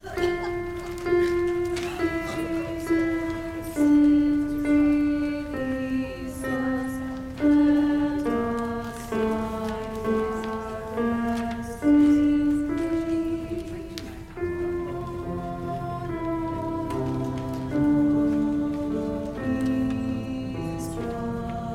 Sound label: Advent Peace Canon alto; Advent Peace Canon alto at 21